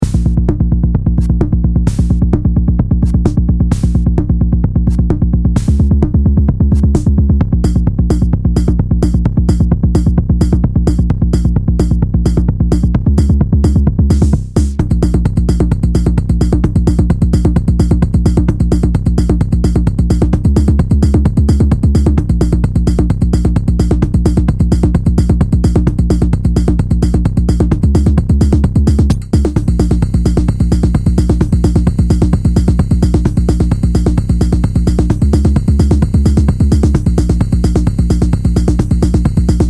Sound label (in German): 16tel Bassline